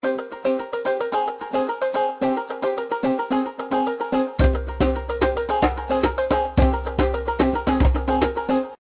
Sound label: ringer; Ringtones